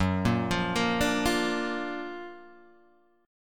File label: Gb Augmented Major 7th Gb down Guitar Open G